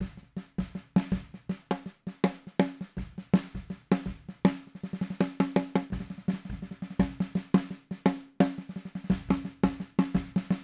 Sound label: Snare, KM84